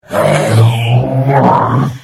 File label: Hit; The Elder Scrolls III - Morrowind